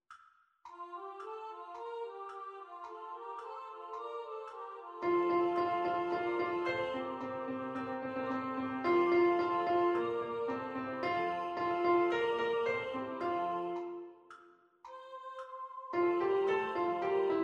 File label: Tenor Basses Piano; VIVA TUTTE LE VEZZOSE